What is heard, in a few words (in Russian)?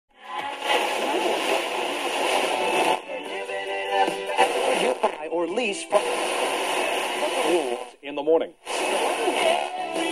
Звук переключения радио с одной на другую волну